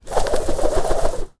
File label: foley rope swing fast lasso